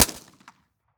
gunshot submachine gun; prosoundfx

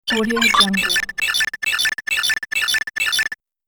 scifi loading bar

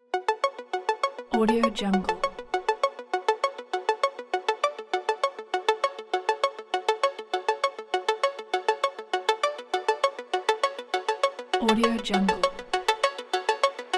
synthwave dream

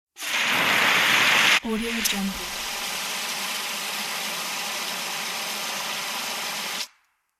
fire extinguisher spray

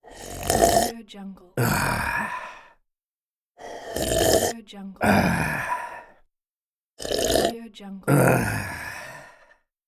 drink sipping

